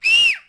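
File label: Settler Child Die Vox; Civ4 warlords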